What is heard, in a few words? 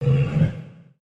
monster growl